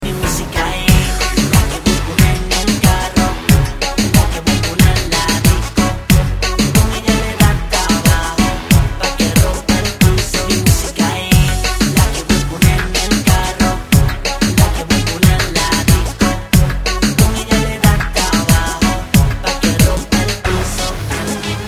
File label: ringtones, reggaeton